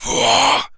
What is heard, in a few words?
Jump, Zombies; zps; Carrier